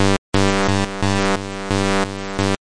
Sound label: 176 buzzy-sh-synth, synth and electronic loops